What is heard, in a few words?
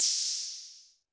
Roland TD-20 Percussion